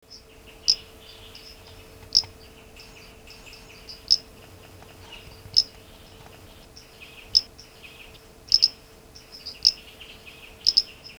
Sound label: Mantella aurantiaca